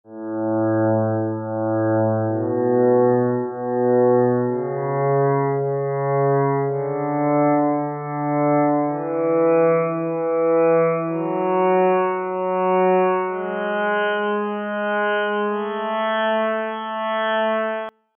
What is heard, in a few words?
cello